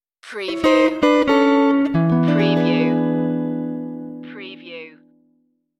on the range stinger

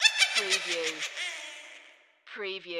Witch Laugh Evil; Sound Effects Bible

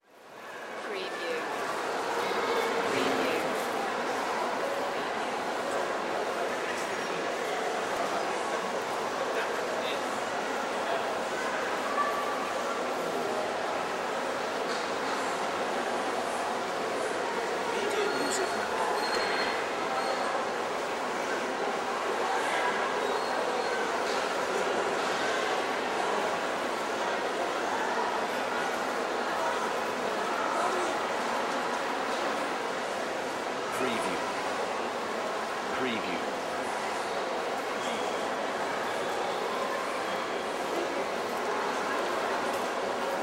trafford centre upstairs

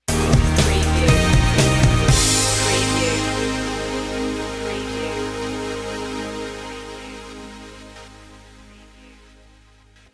starstruck stinger

